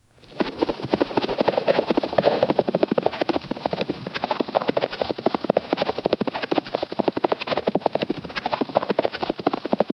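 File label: a fast running horse on, ground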